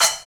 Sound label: Splash Cym Stop; Roland BK-5